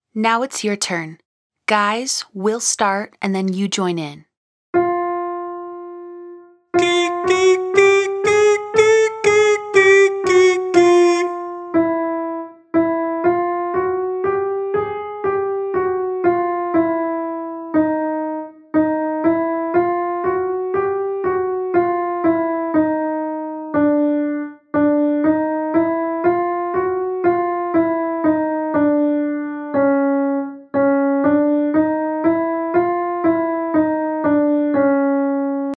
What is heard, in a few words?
Gee Gee Gee 5 Note Scale Male